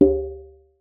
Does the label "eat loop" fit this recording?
No